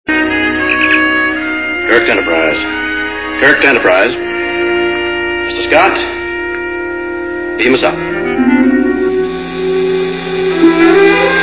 beam

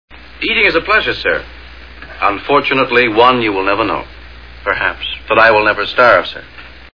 eating

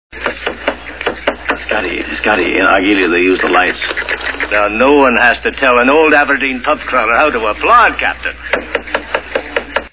applaud

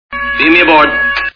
beam

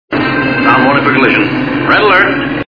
alert

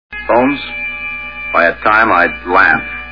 laugh

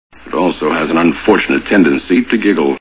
giggle

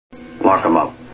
lock

